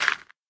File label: gravel, blocks